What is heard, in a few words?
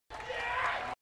yea scream; eric andre